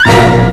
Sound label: ORCH, FAIRLIGHT CMI IIX STRINGS